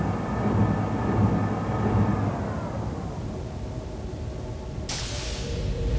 lift up; Star Trek

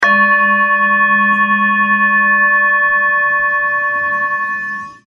Tibetan Bell

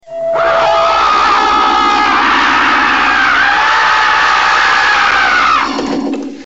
The Phantom Screams

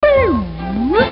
bewhoop; Loony Tunes

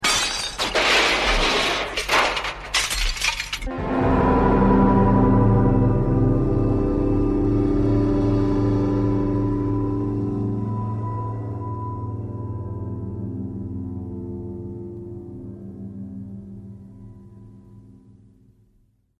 Mirror Smash

